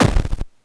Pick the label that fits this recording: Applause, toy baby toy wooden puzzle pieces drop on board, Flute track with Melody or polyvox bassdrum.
polyvox bassdrum